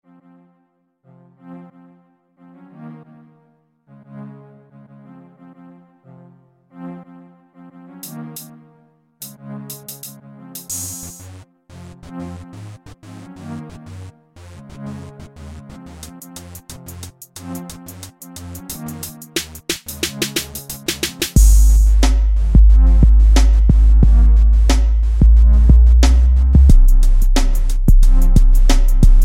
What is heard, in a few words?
kick classic